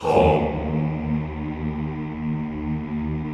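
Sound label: Vohmm Houuu, Chorus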